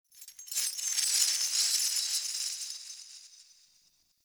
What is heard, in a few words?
Sky Fortress Chain Move Trippy; Ambience; Ode Sky Fortress; Fortress Tunnel